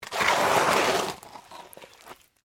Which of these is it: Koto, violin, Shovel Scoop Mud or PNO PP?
Shovel Scoop Mud